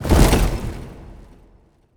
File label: environment rocks falling start